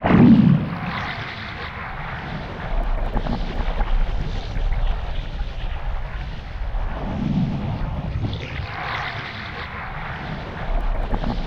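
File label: player enter tbeam loop; Portal 2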